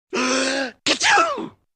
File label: gasp and achoo